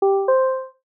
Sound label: question mark pluck; video editing